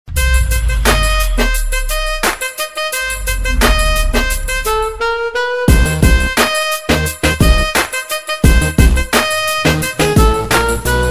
Awesome Music, Ringtones

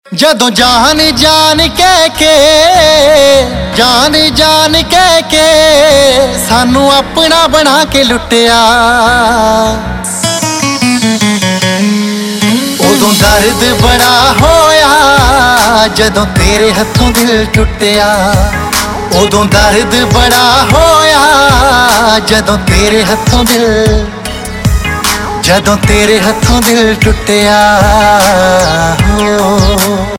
Triangle, Rabab

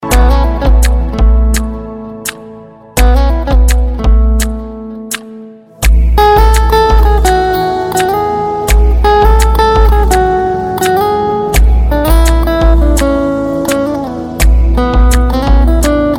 tone; Valentine Tones; Valentines Spl

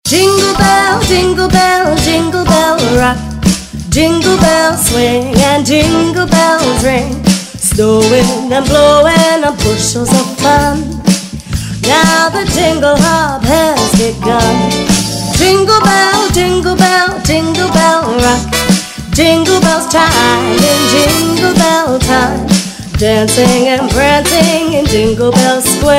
Jingle Bell; Christmas

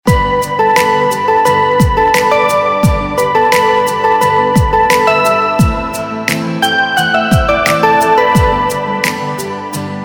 Instrumental Ringtone; Bollywood Hits (2014)

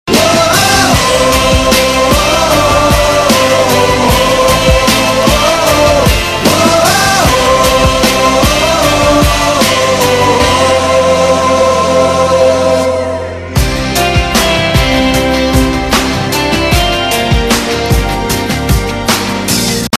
Power Ballad, Theme; Bollywood

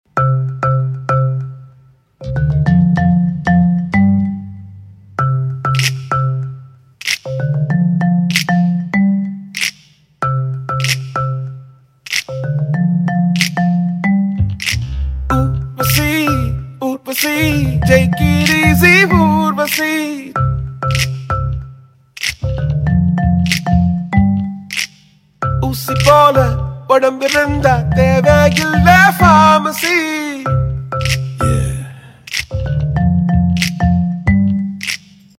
Instrumental

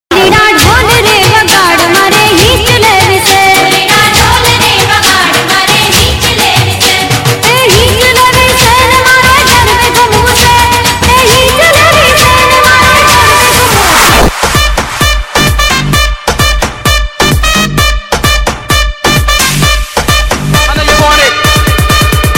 Dholida Dhol, Ringtone; Versus Ringtone (VS)

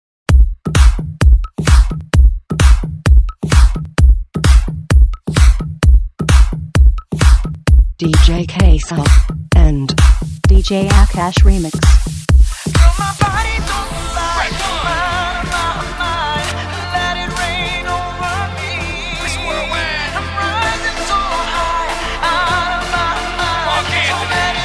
Electro Groove Mix

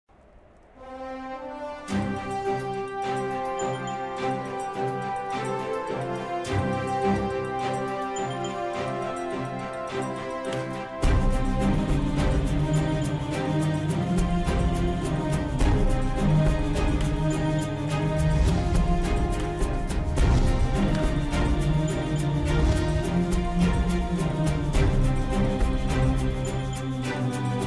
(Instrumental Tune); Tv Serials; Star Plus; Mahabharat

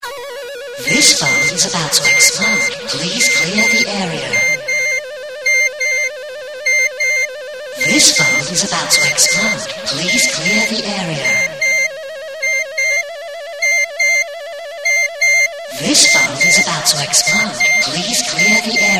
London Transport Tone

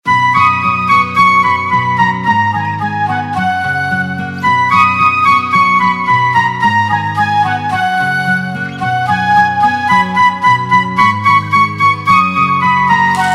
Instrumental; Bollywood Hits (2014)

